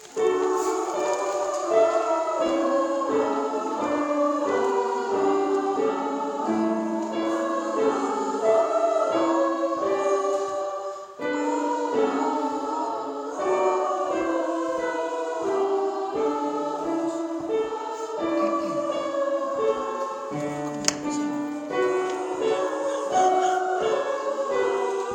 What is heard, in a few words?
Sop Alt Ah